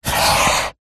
office pencil paper hollow table draw straight line